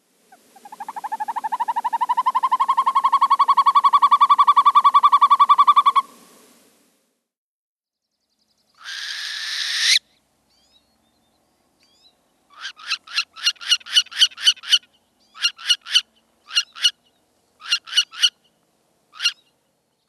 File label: Northern Hawk Owl